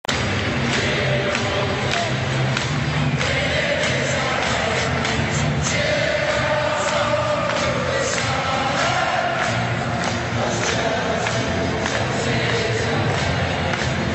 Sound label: Crowd Chorus; Chelsea F.c.; Chelsea community